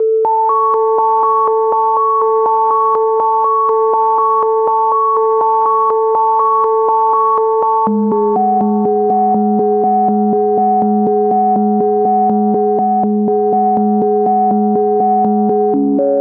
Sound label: 122 BPM Melodic Arps D maj B min Tonic E; MMB